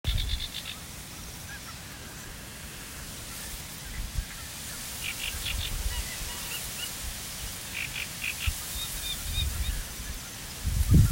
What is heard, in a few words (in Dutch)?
Karekiet